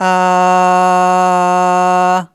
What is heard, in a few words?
Aah Short F#2 Dry